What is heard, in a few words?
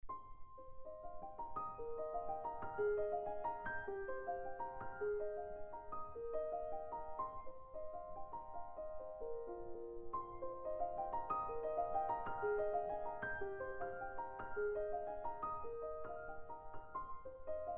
Chinese Flowing Water